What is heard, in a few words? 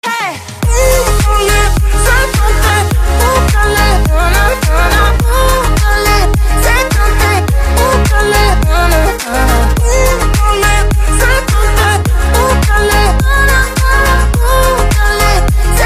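electro ringtone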